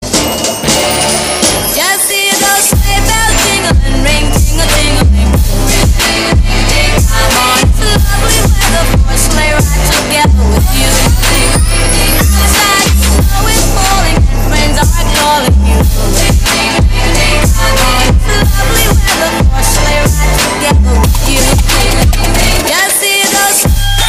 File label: Sleigh bells trap